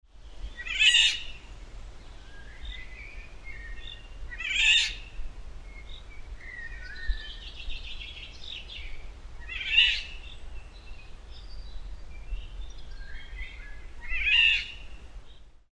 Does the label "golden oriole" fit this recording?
Yes